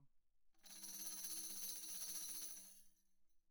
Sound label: tambourine shake roll pp; Percussion